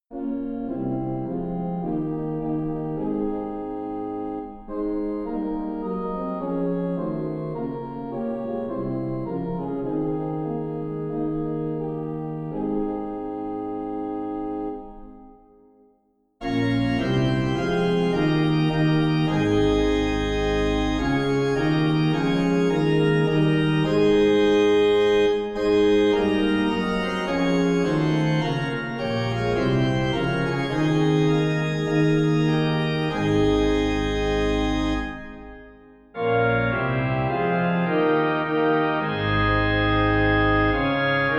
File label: Organ; Moliannwn Dduw Ein Tad; Eccles; 4 Verses